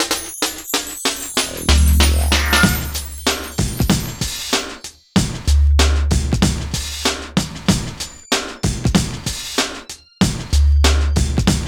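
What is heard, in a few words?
LOOP, left; East West - Phat & Phunky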